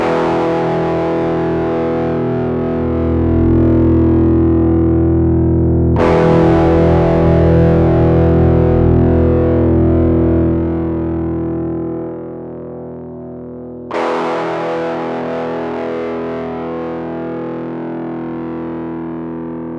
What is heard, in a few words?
bb-preamp bass; xotic testbericht